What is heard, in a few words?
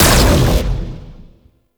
Alt Fire Shot; weapons; Warframe; Corpus; Tenet Quanta